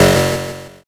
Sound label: zap